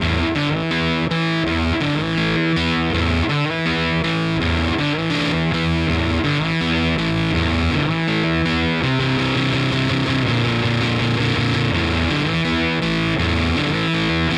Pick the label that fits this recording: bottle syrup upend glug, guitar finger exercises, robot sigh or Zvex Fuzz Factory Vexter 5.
Zvex Fuzz Factory Vexter 5